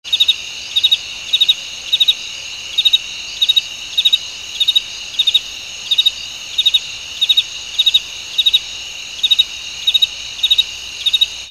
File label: koorogi